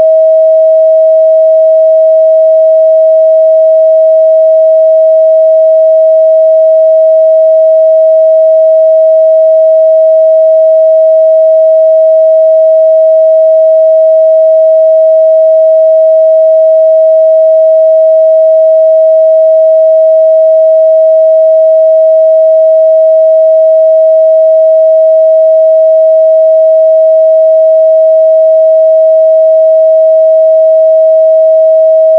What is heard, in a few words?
heartchakra 639hz; Chakra Healing; connecting relationships